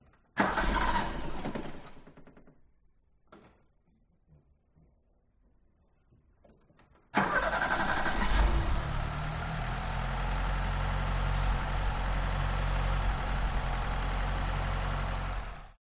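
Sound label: exterior starter fault engine start driver side; detunized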